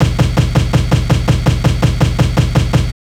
Kik Stab; pitchshifter PSI

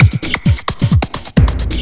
LOOP; SoundFactory; DRUMLOOP

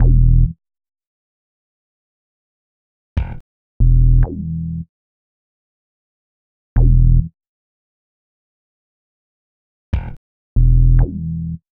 Synth Bass; Basses

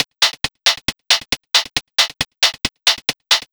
Hi-Hat Loop, Drums, Dance Beats

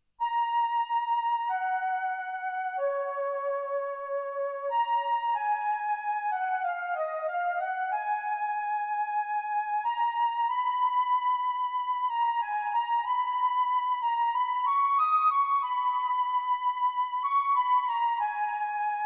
Soft orchestra; Metaphonic

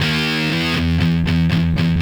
Electric Guitar, Loops; Guitars

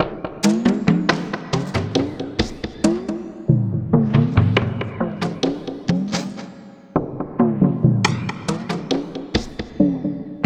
Electronic Percussion

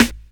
Snare One Shot, Drums

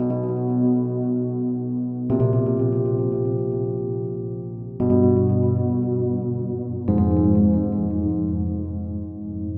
Electric Piano, Loops, Keys

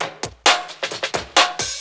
loop; SoundFactory; SMPMANIA

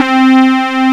C3 SQR LD; roland1

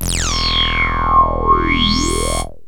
bl dist, yamaha rm1x synth bass and lead

